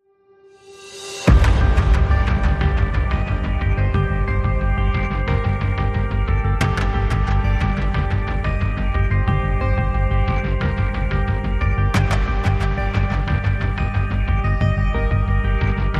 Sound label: Less Percussion